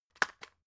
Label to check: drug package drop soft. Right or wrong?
Right